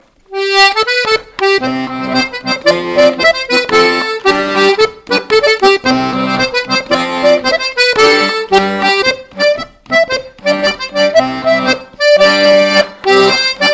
mélodie; Rondèu; Rondèu de Saint Michel; Rondeau 2023

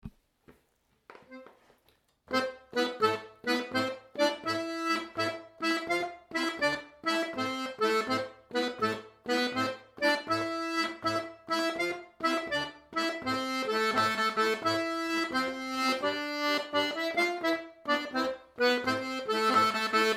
Chapelloise

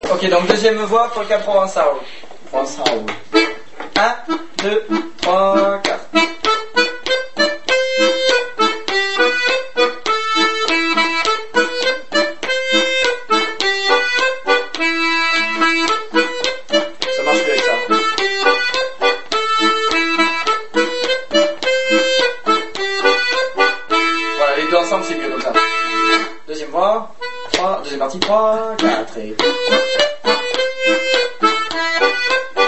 voix 2; Polka provencale

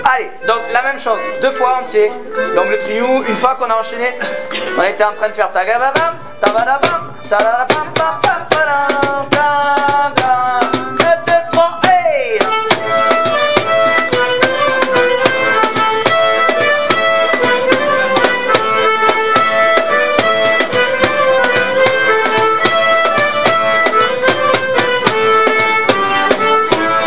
marquage binaire ternaire; Trinhon de Bergon

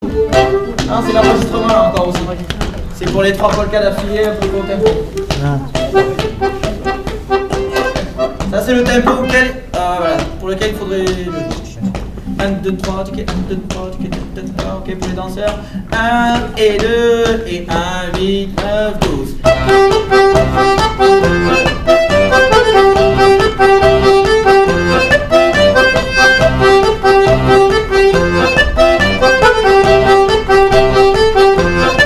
Polkas Tempo normal; Finish set

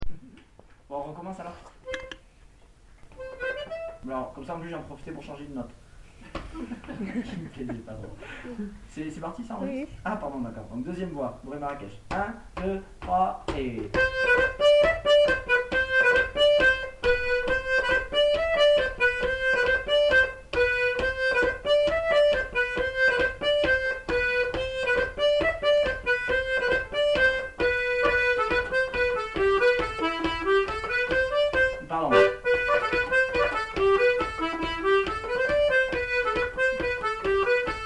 voix 2; Bourree; Musique d-ensemble

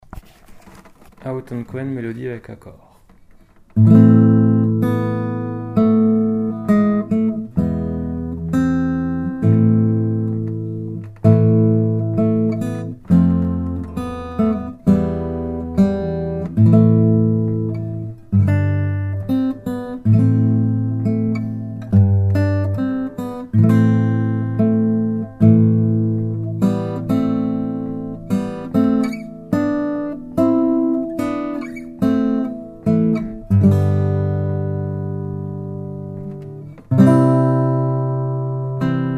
mélodie complexe; AuTonCuenh

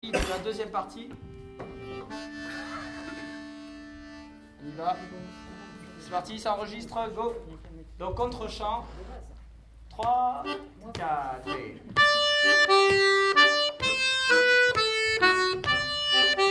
Polka contrechant; Polka ecossaise

